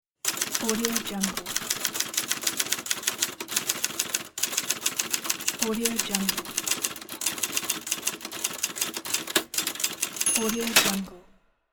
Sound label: typewriter